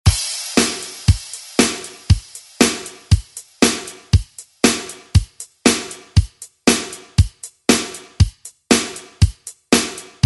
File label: 90s ReDrum; DJ Mhark